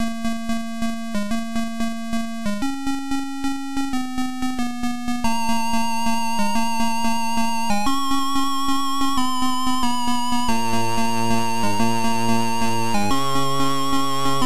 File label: GUITAR